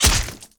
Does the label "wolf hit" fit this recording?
Yes